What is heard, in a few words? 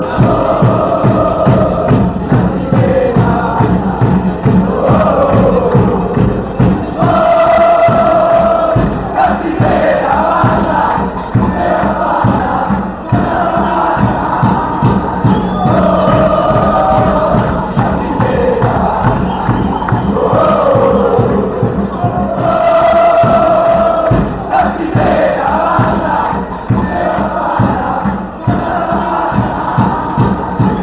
chant, fanchants; belgrano